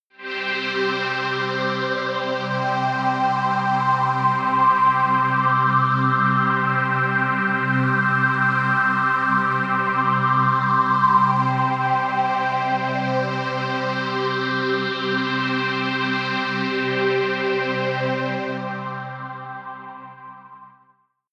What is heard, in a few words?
pad sweep choir; flaechen